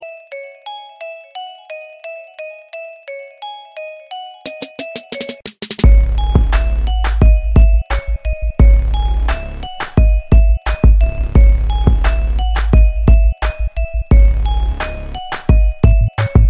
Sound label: Hip Hop Beat